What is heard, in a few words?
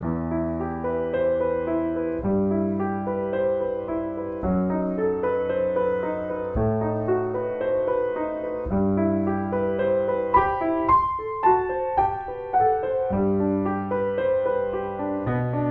piano